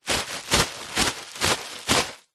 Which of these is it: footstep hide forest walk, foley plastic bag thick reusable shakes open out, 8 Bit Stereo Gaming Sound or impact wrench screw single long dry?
foley plastic bag thick reusable shakes open out